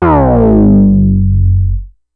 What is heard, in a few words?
Synth oneshot Slide Down F#, Synth Bass; Slate Digital BASSHOLE Sample Pack